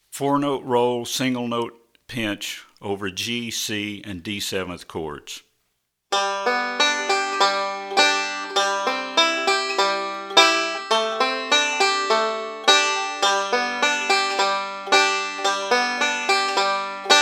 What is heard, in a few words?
Four-Note Roll With Single Note